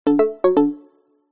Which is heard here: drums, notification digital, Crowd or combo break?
notification digital